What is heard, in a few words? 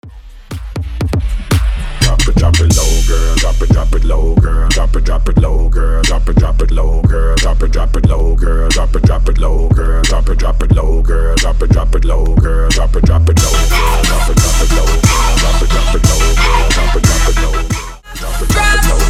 Transition Wordplay Segue